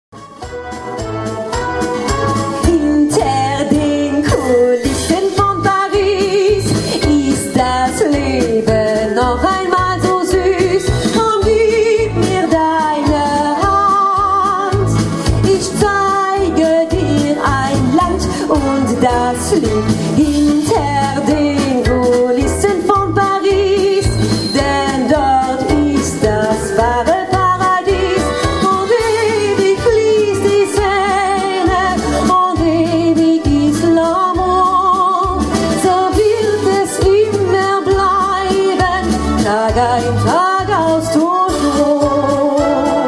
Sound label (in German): live Ausschnitt; hinter den kulissen von paris